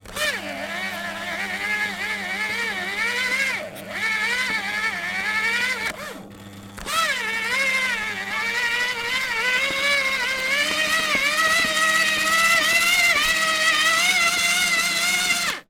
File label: office paper shredder overload struggle